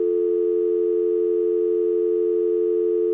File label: dialtone; PC Gamer IT CD 31; BlackDah